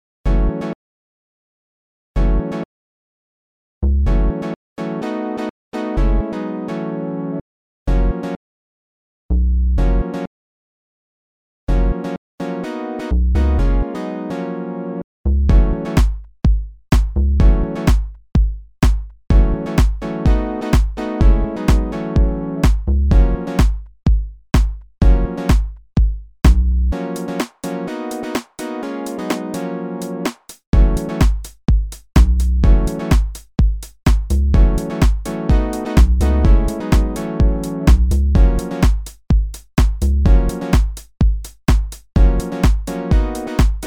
electronic dry; dense fog machine

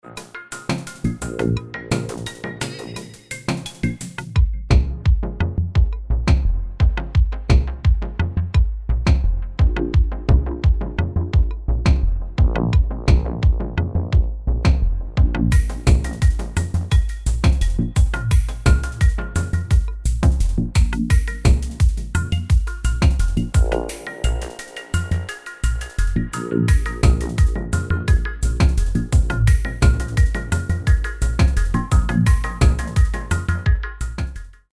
electronica fog; dense fog machine

